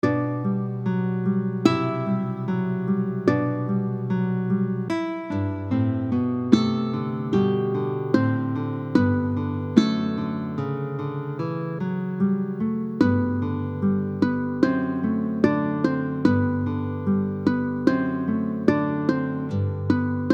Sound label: Guitar Uke Duet; Maestoso; Guilliani